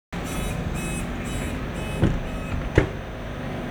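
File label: Door Close, Train; OpenBVE; Expreso CIWL Fleche DOr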